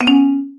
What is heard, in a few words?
Notifier, BlackBerry; BlackBerry 9000-Bold